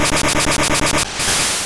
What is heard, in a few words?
Whirl Wind; Pokemon; Gen 2; attack moves; GSC